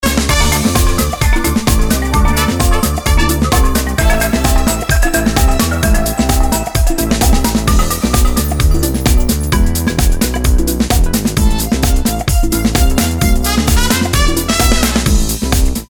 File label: POP